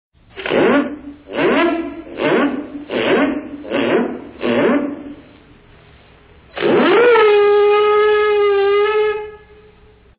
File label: Broken fire horn